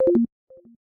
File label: Call Disconnect; 三星note10手机系统铃声